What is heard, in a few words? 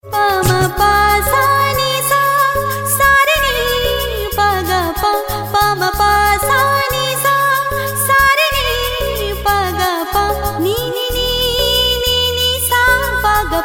Indian Classical